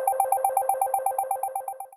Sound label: ringer standard, ringtones; Mozilla Boot2Gecko